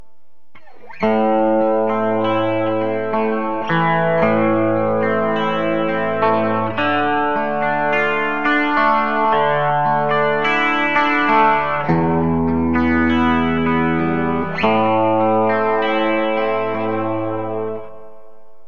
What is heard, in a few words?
bridge clean; Burny